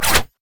dance strike; LoL; fiora

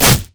spider basic attack; Elise

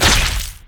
basic attack; sejuani

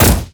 rumble basic attack

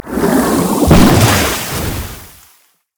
nashor propel; LoL; monsters

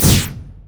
rapid fire; rocket tristana

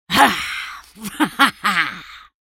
spell cast effort, en US; Elise

